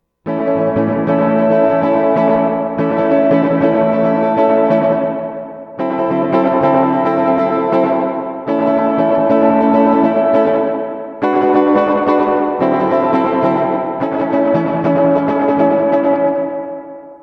Reverb Max, guitars; Pedal Effects; BYOC

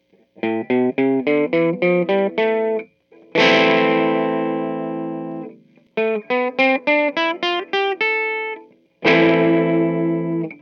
A Minor Neck, guitars; Vox AC4 Mods

